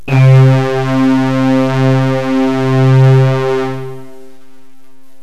synth fat; Terra Sound Library; construction set

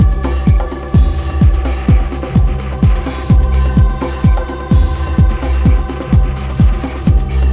tribal

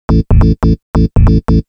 SNTH BASS TEKNO 140 A, Techno, Bass

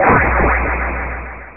SPLAT; dithell in space

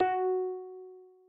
Sys App Message; Kubuntu 8.10; KDE

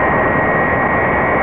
Planes; topgun

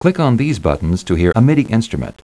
midi; IBM PS1 Multi-Media Master CD

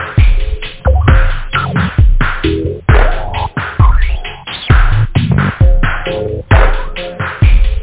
trance; OctaMED SoundStudio V1; house

